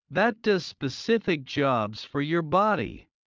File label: Heart low; Classroom humanbody